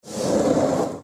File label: Bookshelf Move Sound; don t be afraid the first toy